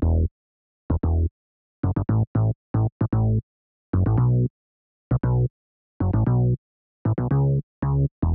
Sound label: Bass, Am 115bpm; Zero-G; Nu Disco; Construction Kits